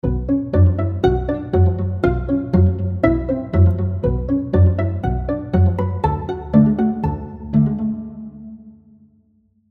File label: Solo, pizz; Hall of the Mountain King; Embertone